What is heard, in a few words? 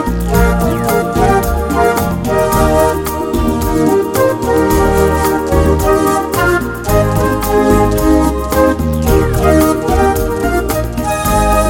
Great and Smooth Bolero